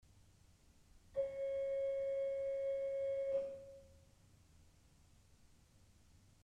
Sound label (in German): Principal 4' cis1, ORGEL pipes